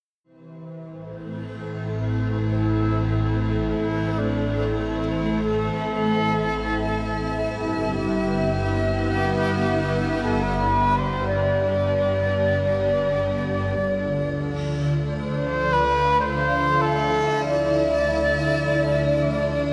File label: Rainforest Longing